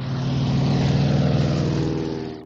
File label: airplane engine; sfx